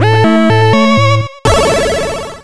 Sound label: Music